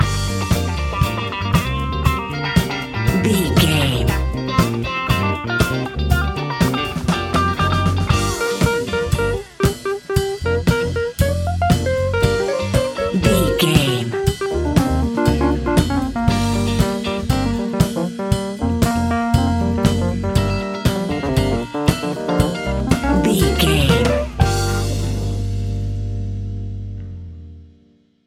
cosmic disco

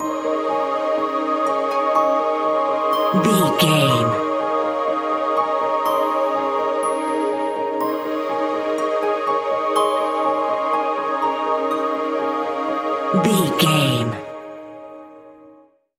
enchanted magical forest bumper

